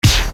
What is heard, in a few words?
Bass Dr, EuroDance Bdrums; Best Service; Ethnic Journey Sound Cube; EuroDance Essentials